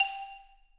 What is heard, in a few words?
xylo; SDIF; ICMC2000